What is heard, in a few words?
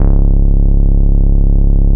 C#2, Boom da Bass; E-MU Producer Series Vol. 6 – Analogia Project (CD 1)